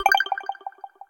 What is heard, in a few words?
chain